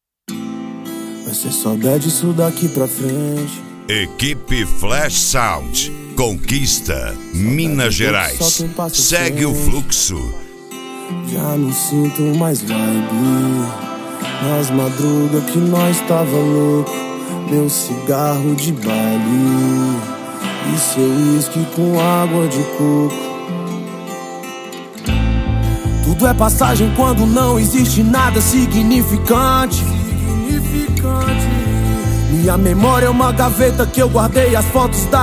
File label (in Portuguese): rap; cd rap flash sound